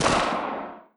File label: mp5 distant; hl1 weapon